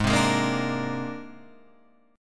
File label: C 7th Suspended 2nd Sharp 5th G sharp